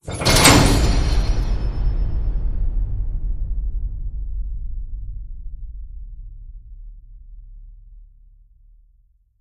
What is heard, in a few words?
hit; Hit Bolt Skyfist